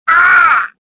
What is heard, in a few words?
pain